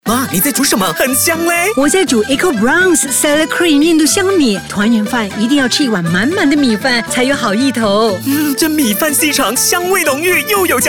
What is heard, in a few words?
Marketing MELODY Sound Track; DigitalAds